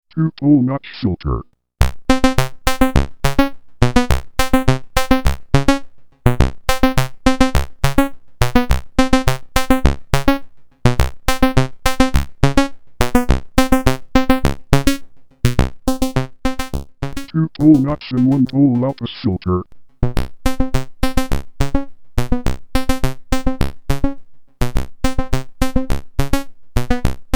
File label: Filter Types